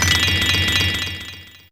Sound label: Bll Tr A4, Bells; Fairlight CMI IIe